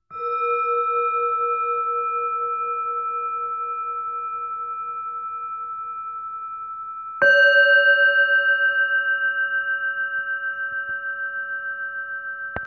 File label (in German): Blume Klangschale